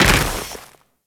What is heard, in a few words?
Moa Combined Step Snow; Enemies; Warframe